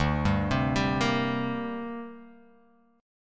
D 7th Suspended 4th Sharp 5th, Guitar, Open F; arpeggiated; JGuitar

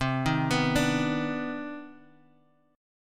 C Minor Major 9th C; arpeggiated; JGuitar

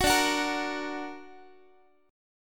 D Augmented Guitar, chord; strummed; 21,22,21,20,x,x; 4A,4E,5A,5D,5G#,6C#

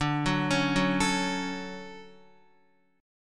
D-Major 7th D down Guitar; DM7; arpeggiated; JGuitar

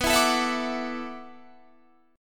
E 7th Suspended 2nd Suspended 4th, B

